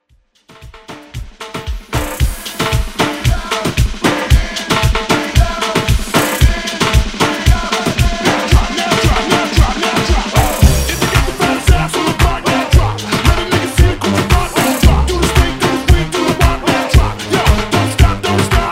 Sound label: Moombah Hype